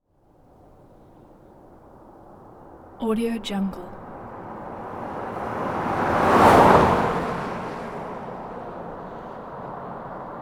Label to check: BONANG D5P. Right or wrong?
Wrong